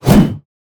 Axe Swing

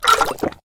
Drinking Potion

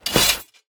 Weapon Equip

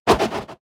Axe Throw

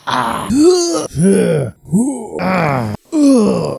male hit sound

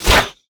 Arrow Shoot

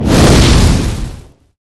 Fireball Attack

